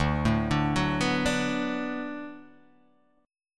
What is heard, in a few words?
D 6th D down Guitar Open D, chord